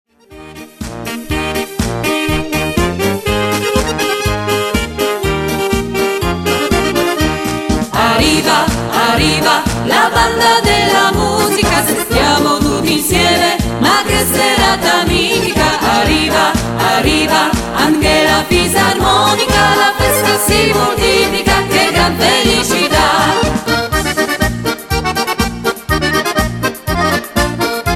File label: Polka